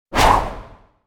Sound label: Dragon tail swipe